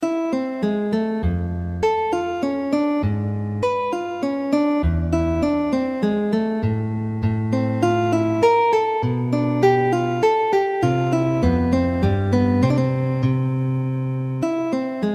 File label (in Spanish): suite laud; bach johann sebastian; bwv0995